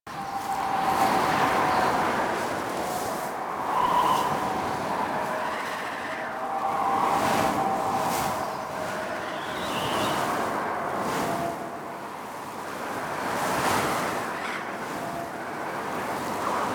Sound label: Landscape Wind High Loop; Warframe; Ambience; Venus Open World